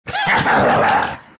vipe laugh; dota; viper

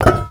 weapon drop dull

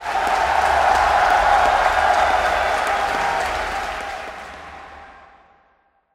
cheer dire, spectator; dota

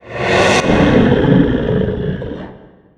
summon wolves; weapons; dota; lycan

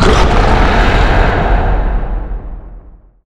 onslaught cast; weapons; dota; primal beast

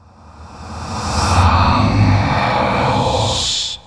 incant; warlock

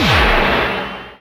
poison cast; weapons; dota; dazzle

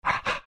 pant big, greevil; courier

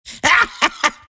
laugh; slark

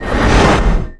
astral imprison stop; dota; obsidian destroyer

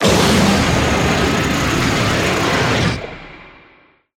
roar; gore magala; dota; mh event

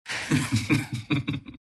laugh; Winter Wyvern

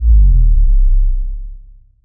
healing ward stop; weapons; dota; juggernaut

